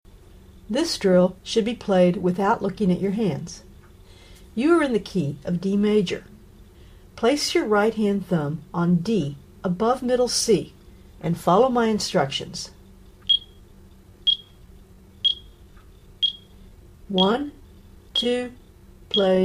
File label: Tactile Interval Drill D Major, piano